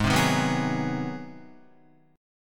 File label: G sharp 7th Sharp 9th, Guitar; G#7#9; strummed; 3,3,3,4,6,4; JGuitar